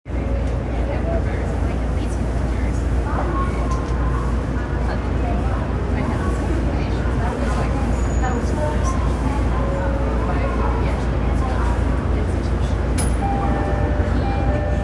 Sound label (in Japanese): 小田急線下北沢西, train sounds